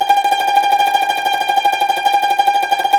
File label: CUMBUS TR, Cumbus Tremolo